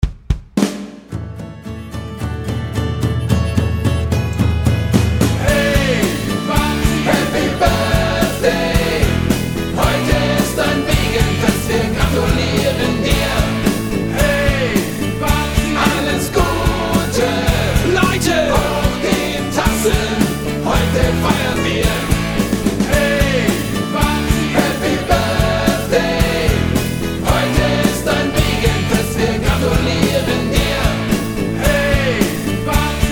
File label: Happy Birthday Babsi